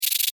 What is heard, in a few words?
Beetle, Rattle, Vocalization, Insect, Bug; Sound Effects:Animals:Insects